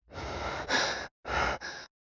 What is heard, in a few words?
Male Pant